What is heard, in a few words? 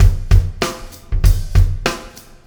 Landing Party Beat, Processed Drumkits